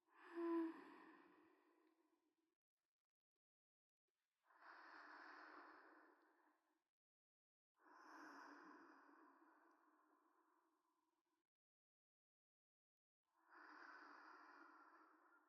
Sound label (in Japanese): 添い寝ループ, ASMR